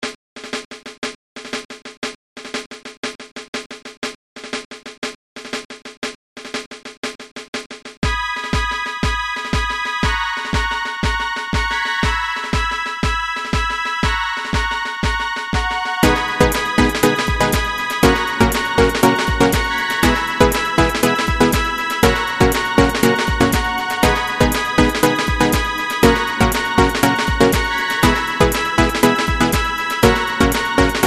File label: Funky